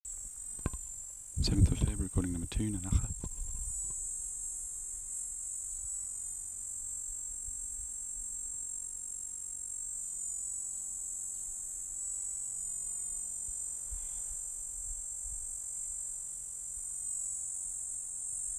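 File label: Platypleura plumosa Nanaga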